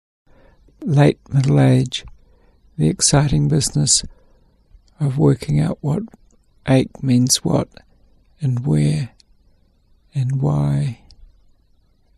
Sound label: middle age ache pain